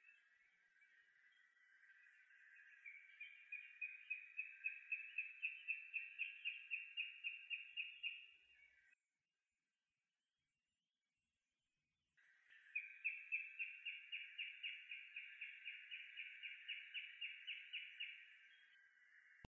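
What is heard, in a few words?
northern goshawk call; ootaka nakigoe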